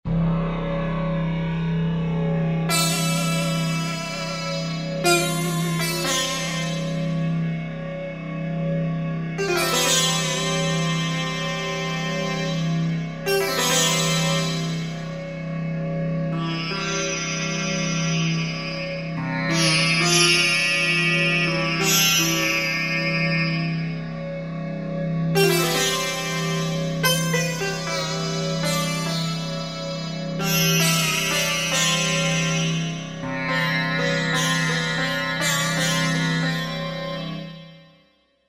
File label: Indian Duet, Korg TR-Rack